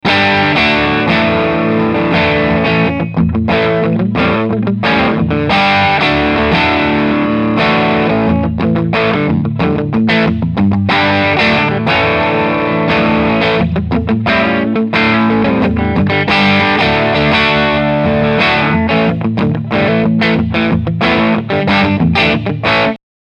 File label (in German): Beispiel Crunch